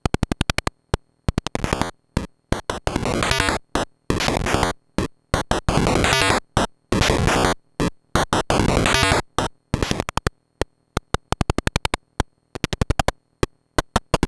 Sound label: vc volume, pokey